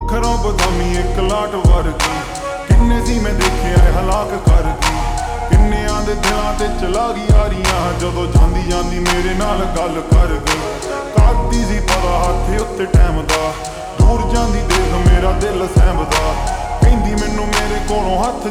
Ringtone Slowed Reverb

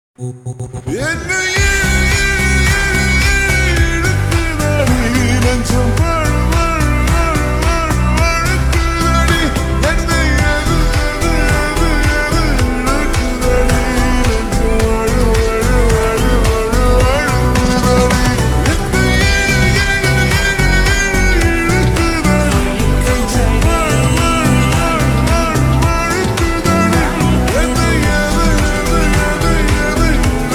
Ringtone Slowed Reverb; tamil

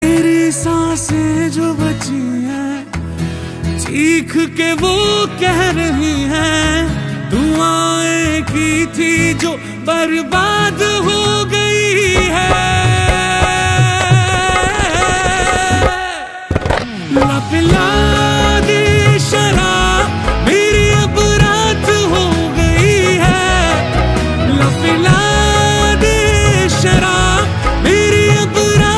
Ringtone

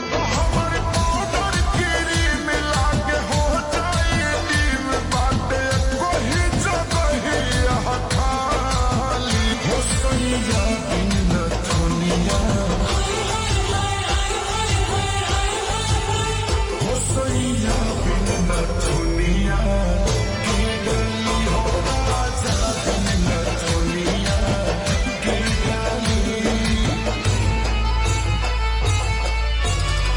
Slowed Reverb

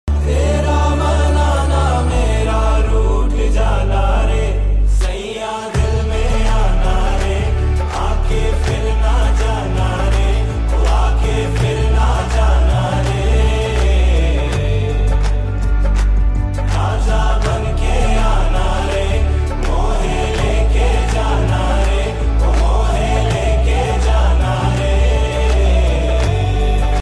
Ringtone

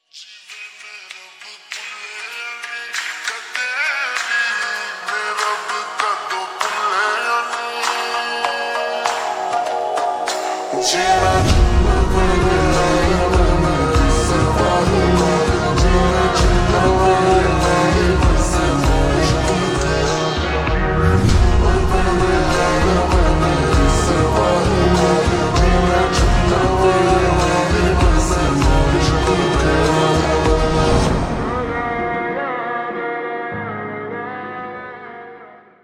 Slowed Reverb

